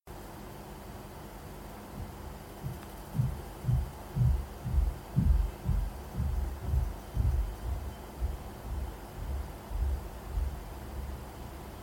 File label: footfalls bedroom concrete ceiling